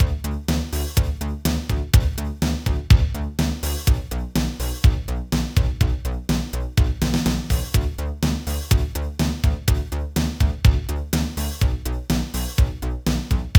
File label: Gated Reverb Drums